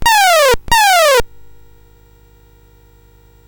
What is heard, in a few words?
Number Quest BASIC, CSG8580R5; GAMES